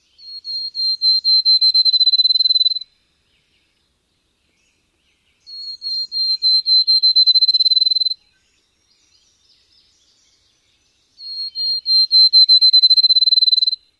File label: song